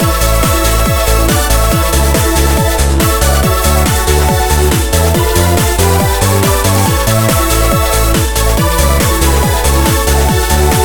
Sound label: Edm Loop